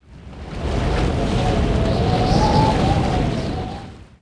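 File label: strong wind; ziranjie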